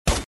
攻击

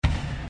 大炮; 武器音效

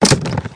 钻石宝箱打开音效

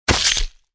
跳脸抓痕

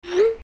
微信发消息

